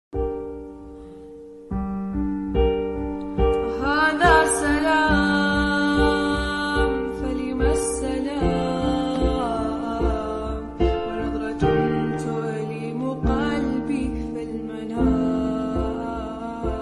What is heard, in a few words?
slowed ringtone, arabic nasheed